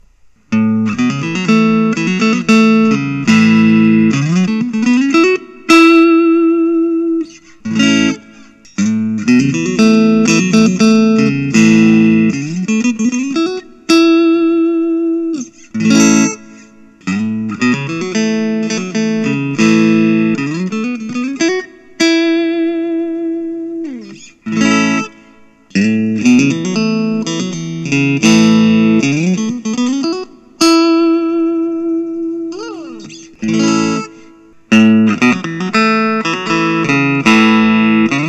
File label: grosh retro; olympic white